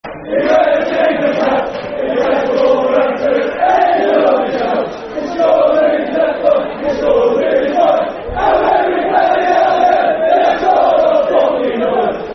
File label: Football Chants, Soccer Song; Stevie Bull; Wolves; wolverhampton wanderers; fanchants